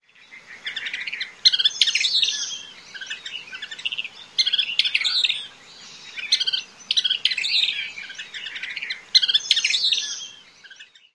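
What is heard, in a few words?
thick-billed kingbird song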